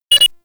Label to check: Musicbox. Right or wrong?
Wrong